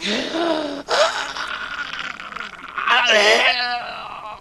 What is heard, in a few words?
GASPS FOR AIR; gasping